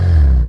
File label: Car X End; PC Gamer IT CD 49; UrbanChaos